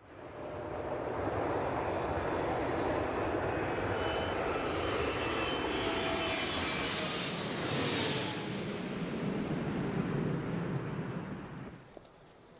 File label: Limo Atmo